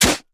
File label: spear strike soft; Rust